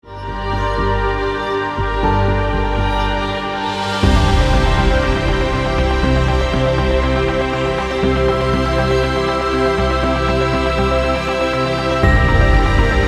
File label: Ambient Cinemascapes